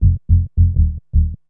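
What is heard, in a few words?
synth bass, drum and bass, 160 bpm, C; eJay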